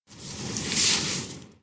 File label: skiing direction; Downhill Ski